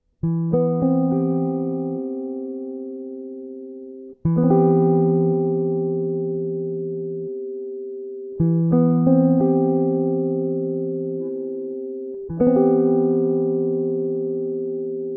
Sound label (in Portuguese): Campo Harmonico Maior; Jazz Harmonia